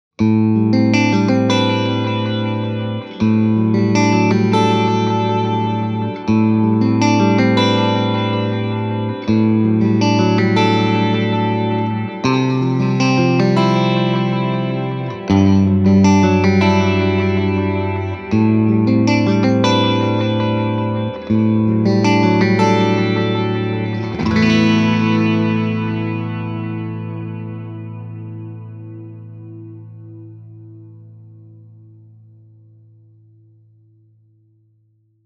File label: Tele Clean Double Delay